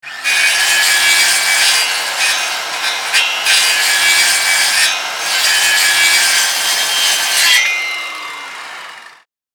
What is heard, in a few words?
Industrial circular saw cutting metal